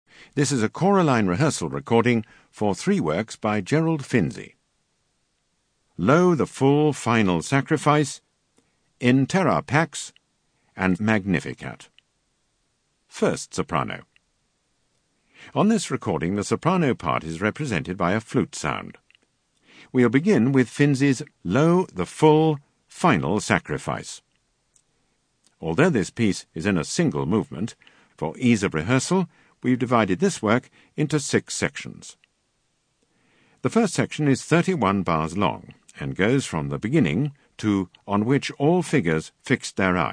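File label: Soprano; Finzi Collection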